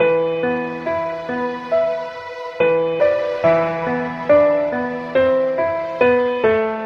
piano, sad loop; loops

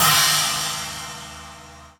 Cymbals Normal

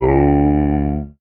low note, Voice; Notes

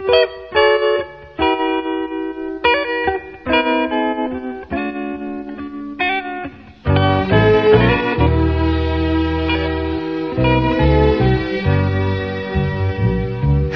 guitar, Loops Phonky

